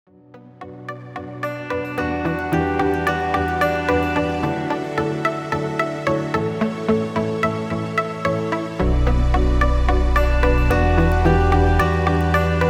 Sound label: Corporate Chill